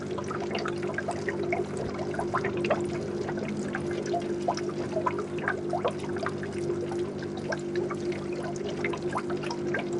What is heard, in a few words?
fish tank; Golden Crayon Sounds